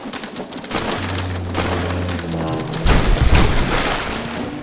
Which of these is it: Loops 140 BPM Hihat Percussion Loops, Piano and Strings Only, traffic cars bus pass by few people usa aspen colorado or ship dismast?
ship dismast